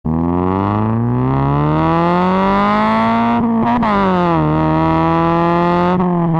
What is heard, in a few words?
YEEEEEEEEEEEEEEEEEEE